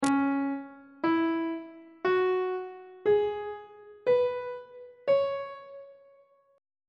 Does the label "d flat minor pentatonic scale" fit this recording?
Yes